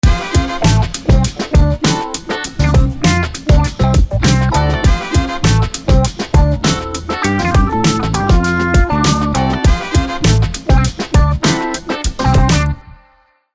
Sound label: a glass of water jingle